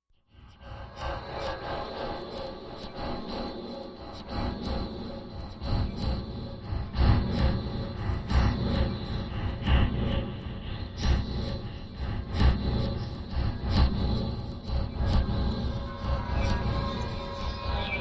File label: Fear Low Vibration, horror